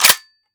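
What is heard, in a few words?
bolt release, m1928; svencoop; ins2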